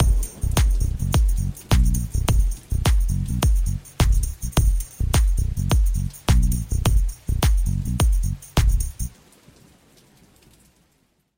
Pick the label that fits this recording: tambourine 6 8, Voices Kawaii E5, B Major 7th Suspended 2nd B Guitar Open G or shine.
tambourine 6 8